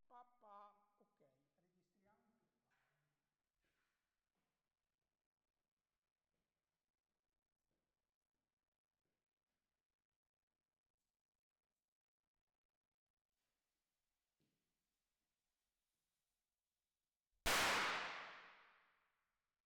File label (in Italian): Petardi; Bedrock Omni; Corso acustica